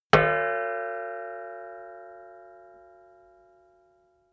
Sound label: plate hit ring medium; hits impacts